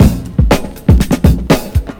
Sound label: thunder